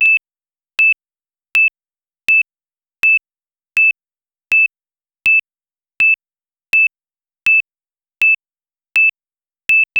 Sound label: a tense loopable 1 second, warning